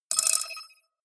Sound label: computer sound, signale; soundpool